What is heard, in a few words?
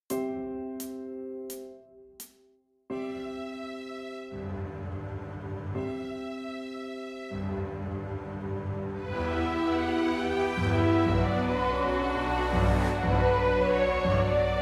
backing track